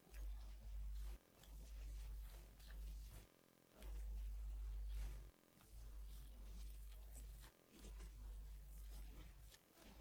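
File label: backing track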